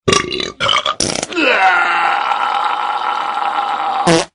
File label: window sound